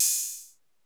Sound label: 808 OHH, Electro Samples; Central Processing Unit